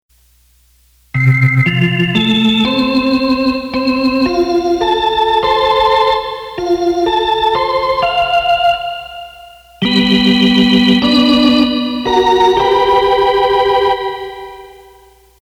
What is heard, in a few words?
Rotary Organ; ketron